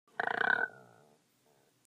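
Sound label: Gurgling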